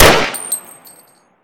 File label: mpx shoot; Tacz1.1.5+; RSWS 1903